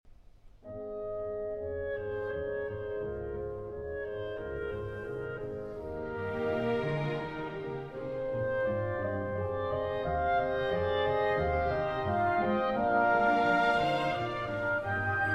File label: Symphony in C Minor; Symphony No. 1; Op. 68; Brahms